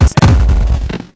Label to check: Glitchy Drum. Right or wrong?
Right